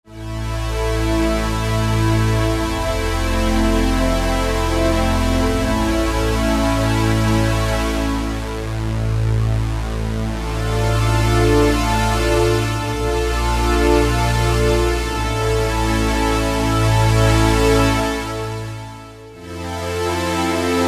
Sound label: STR, Roland SR-JV80-04 Vintage Synth; MemoryMG STR